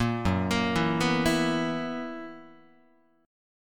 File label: F sharp 11th F sharp Guitar; arpeggiated; JGuitar